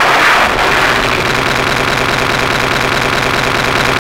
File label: WAR FX; Hard & Loud Techno; USB Soundscan vol.01; AKAI